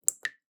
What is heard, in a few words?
Drips